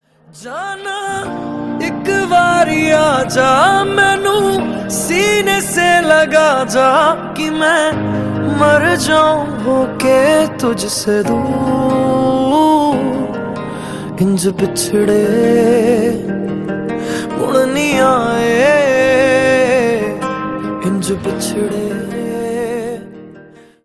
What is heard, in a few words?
Acoustic Version Ringtone